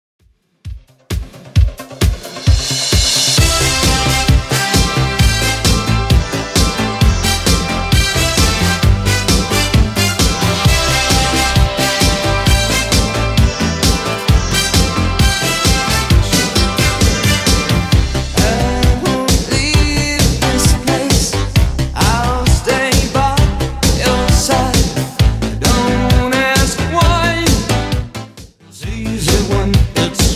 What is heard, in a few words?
80s Redrum 132bpm